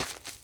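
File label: step grass left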